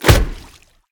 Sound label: hit ice; smite; god merlin